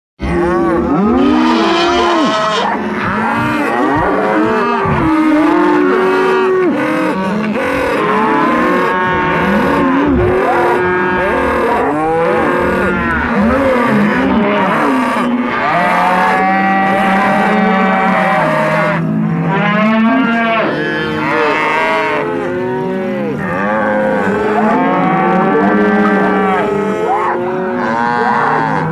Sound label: livestock; plague